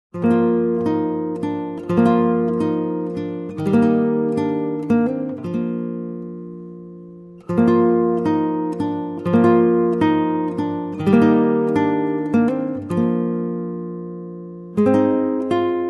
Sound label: Christmas Eve Lullaby